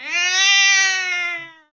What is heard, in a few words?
horror cat